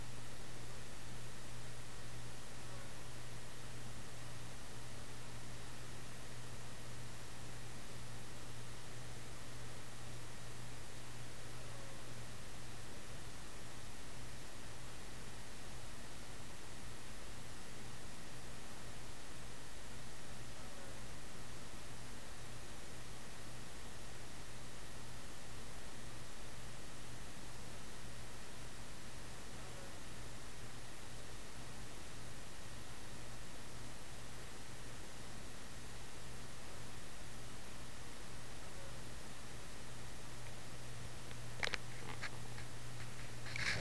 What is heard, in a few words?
ultrasound speaker hello and recorded by digital recorder